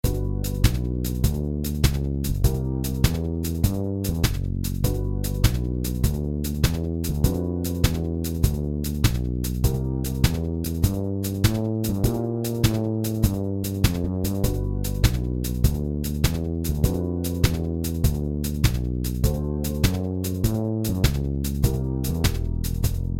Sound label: blues in G; minor pentatonic blues scales